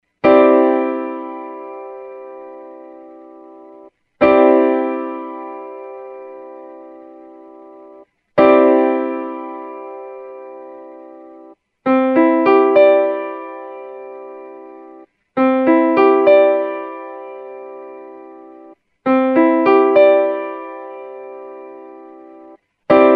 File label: Cadd9 Piano Chord